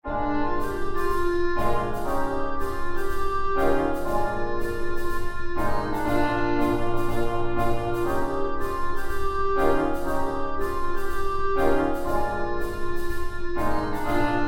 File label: Groove; Instant Success Music for First Year Band and Strings